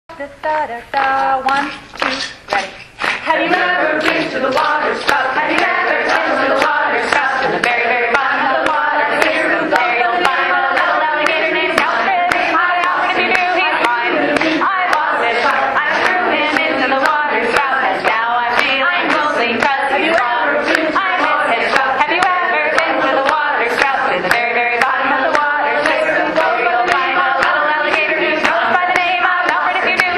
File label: Water Spout